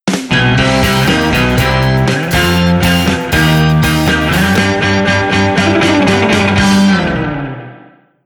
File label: Sting Win (C)